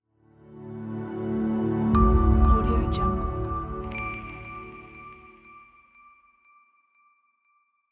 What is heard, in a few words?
opening logo